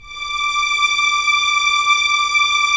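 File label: Strings Violins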